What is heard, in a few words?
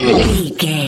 troll monster attack fast